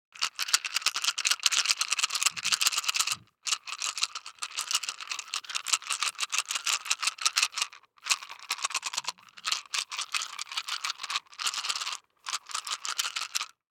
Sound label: DICE in Two Hands, Shake, 16 Sixteen Dice; Samples; ArticulatedSounds; DICE Sfx Party (Extended)